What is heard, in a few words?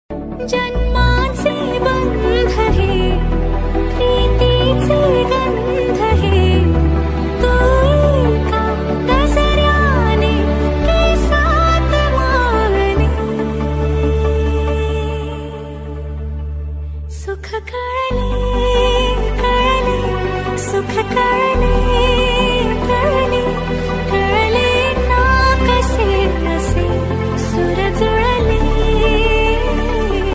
marathi love ringtone